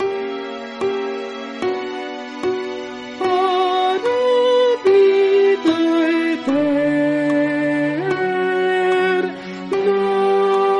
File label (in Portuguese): soprano